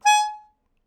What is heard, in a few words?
Melodica Short; Short Notes Chromatic